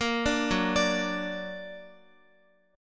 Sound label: F sharp Augmented F sharp Guitar; arpeggiated